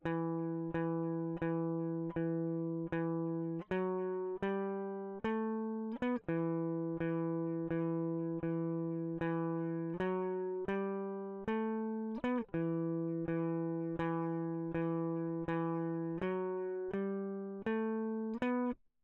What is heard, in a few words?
electric